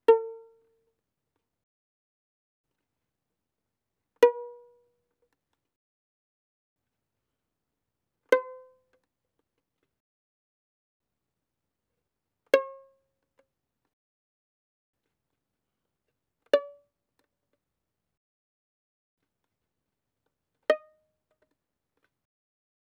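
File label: Violin pizzicato fortissimo sul D A4 A5; Strings; violin2012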